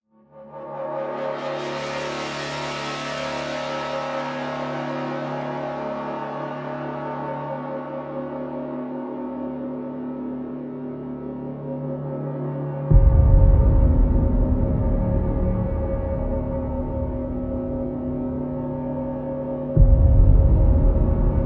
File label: epic atmospheric build up horror music